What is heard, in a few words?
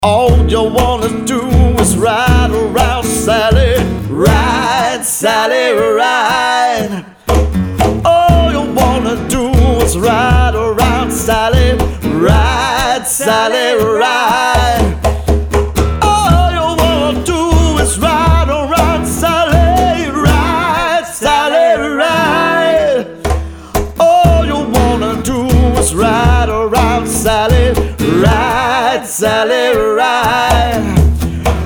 Cover Band